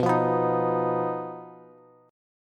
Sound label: B Major Flat 5th B Bass; BMb5; strummed; 19,20,21,20